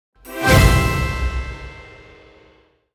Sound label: Level Up